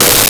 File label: Bassoon G3; Nintendo; July 2020 Gigaleak